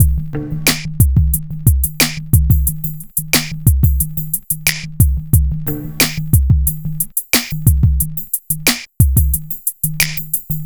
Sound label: Hip Hop Beat, Drums